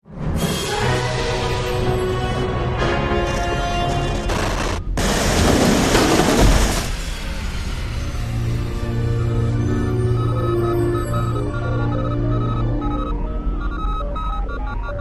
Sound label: Craft Boot Right